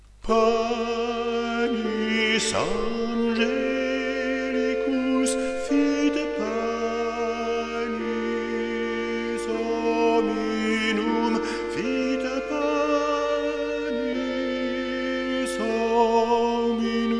Panis angelicus; Casciolini

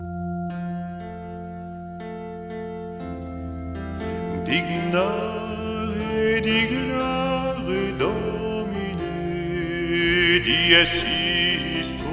Te Deum; Charpentier

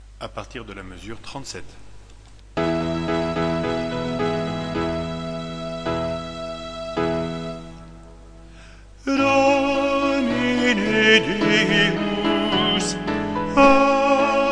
Gloria Solo; Schubert; Messe Sol

